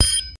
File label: kill, hitsounds; overwatch kill; PD2-AdvancedCrosshairs